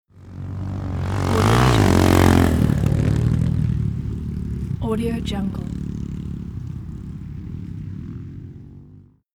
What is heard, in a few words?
passing by motocross motorcycle